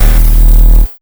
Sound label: glitch